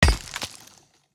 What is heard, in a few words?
axe mining stone; Factorio